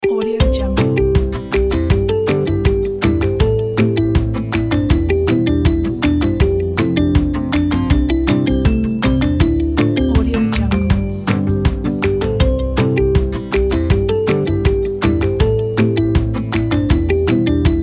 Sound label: the happy kids